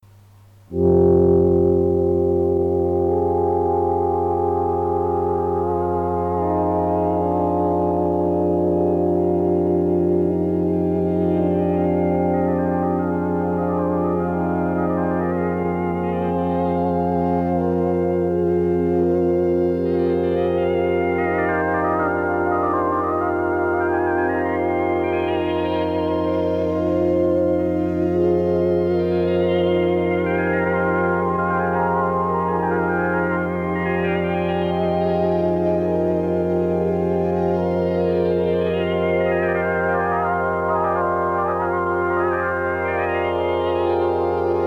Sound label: Casio 500 demos